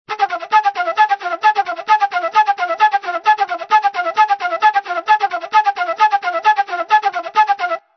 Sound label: FLUTE COMEDY